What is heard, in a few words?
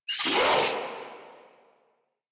Infested Charger Alert, Enemies; Warframe